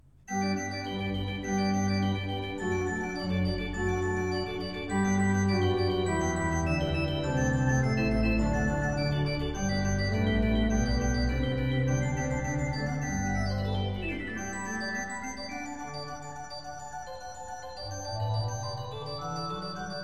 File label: Concerto in G major Presto, ORGAN; Organ Concertos; Concerto No.1; BWV592; BACH